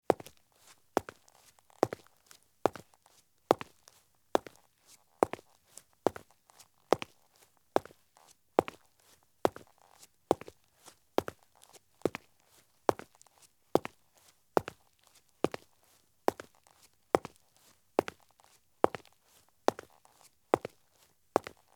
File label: Men's Light Business Shoes Walk at Medium Pace on Concrete; Sound Ideas; Footstep Sound Effects